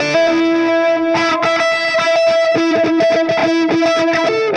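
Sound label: Delay Wah, Guitar; Sonic Foundry (Sony Creative Software); Indie Rock Anthems; Session 02 - Clinical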